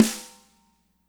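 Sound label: snare right; Spectrasonics; Burning Grooves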